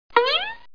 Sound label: flip